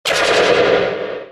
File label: FX Space Cartoons